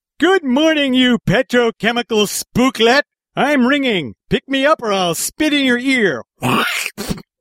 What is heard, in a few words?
Spit; Homer Simpson